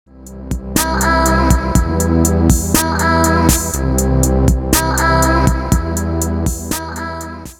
melodiya sms soobschenie

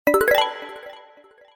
originalnaya melodiya soobscheniy smart; Lenovo

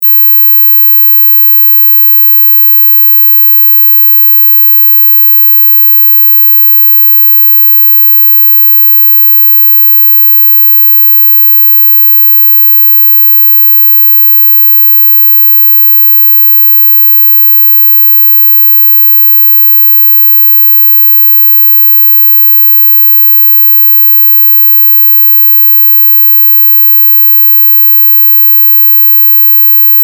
zvuk sinusoida 18000 gc

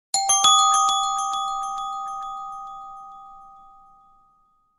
sms soobschenie nokia n8

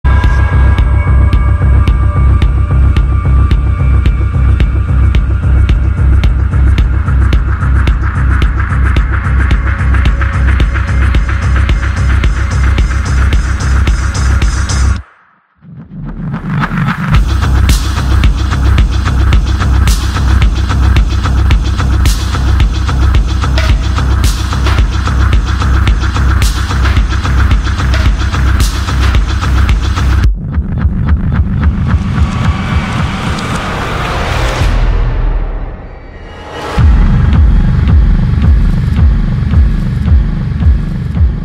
launch trailer iz horror igry; The Flock

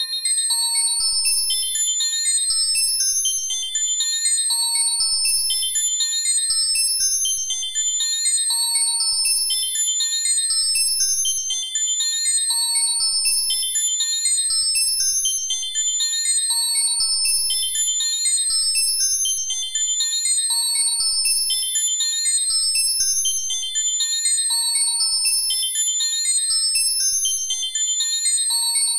ozhidanie zaciklennyy zvuk

